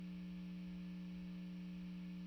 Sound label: GTR